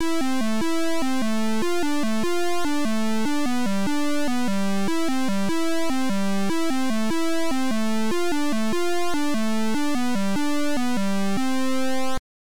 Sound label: Title Beeper; Star Quake